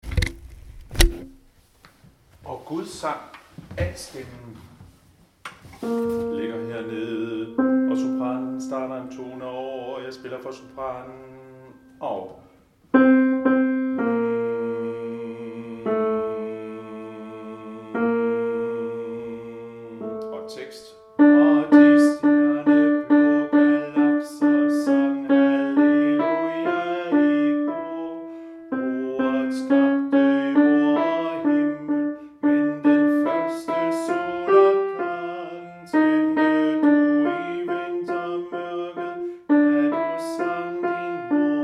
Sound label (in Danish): Og gud sang alt; harlev koret